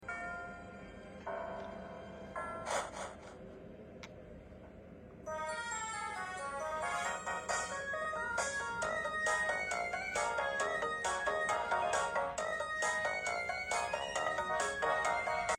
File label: Remix Ringtone